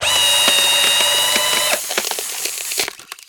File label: cargo ice; planetoid; CosmicRage